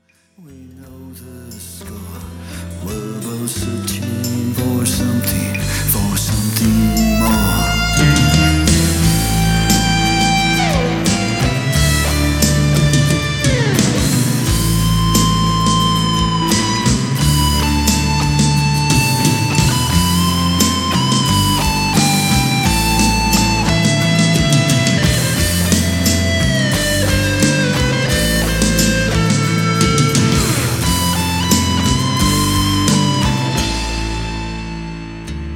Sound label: GTR SOLO